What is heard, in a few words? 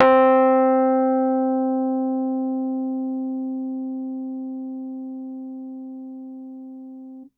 Piano Rhodes c3 hard